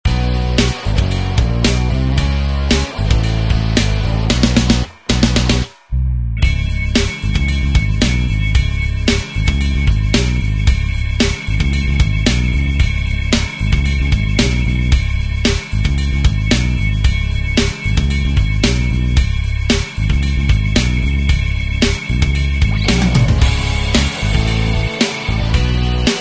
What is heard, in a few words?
backing